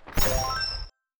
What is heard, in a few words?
small celebration